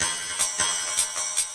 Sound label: 155 hall of clinks, drum loops and breaks; 141 to 160 bpm